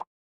cartoon pop

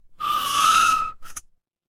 mechanical tire screech

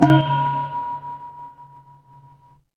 instrument singing bowl

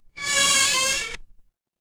door creak

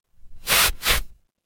paper fabric fabric

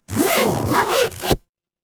paper fabric zipper

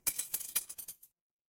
glass shatter

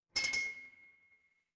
glass clink

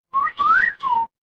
cartoon slide whistle

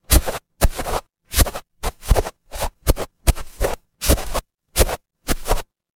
footsteps snow

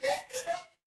ui call end

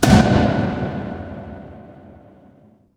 transition braam

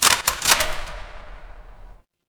door lock

